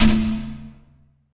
PERC, Drum & Bass, 170 BPM; Ueberschall; Drum & Bass Flash Trackz

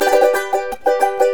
CHARANGO, The Andes

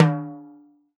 TIMBAL, Salsa Kit; E-MU Producer Series Vol. 7 – Old World Instruments

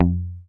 HOF MUT, Hofner mute bass

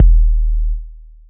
rapper kick; Greytsounds; Scott Peers Sound Engineering

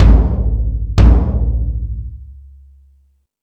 Toms, E-MU Pro-Cussion

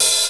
Cymbal, EMU Proteus 3

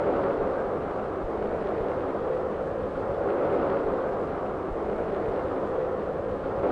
Optigan noise; Optigan Bts

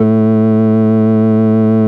FARFISA, A2, Vintage Keyboards

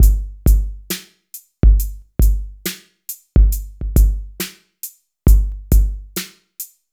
FX kit, beats

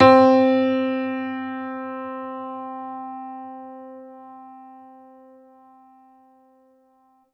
Piano Upright c3 hard

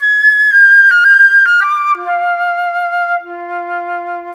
Flute, Orchestral Series Rock and Pop; Sonic Foundry (Sony Creative Software); Orchestral Series VOL-4 - Rock and Pop

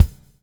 BUBL, BUBBLE; Spectrasonics - Backbeat

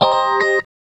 GUITARS 130, House

